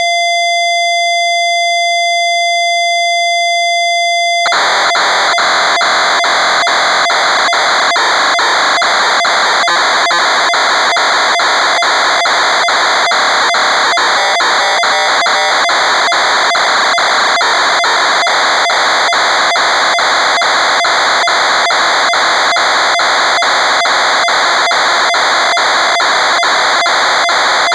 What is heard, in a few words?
PIANOFORTE